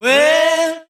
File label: "well", SUNG; Voice; transitions